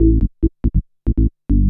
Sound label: SNTH BASS ELCTR 140 A, Techno